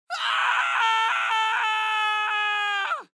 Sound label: Scream